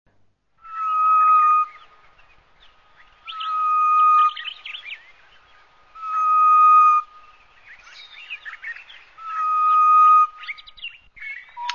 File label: Grey-Headed Bush Shrike